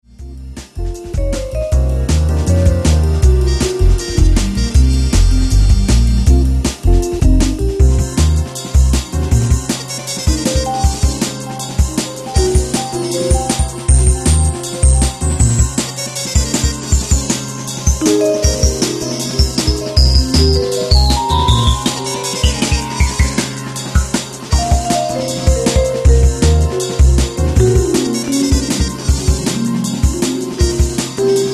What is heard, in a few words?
Jazz House